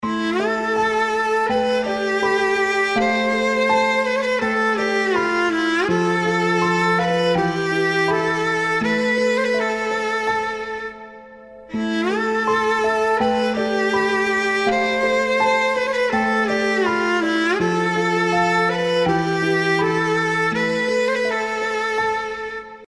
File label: rington mobile; زنگ موبایل ساده و شیک